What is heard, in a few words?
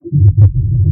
hit; freak fortress 2; malper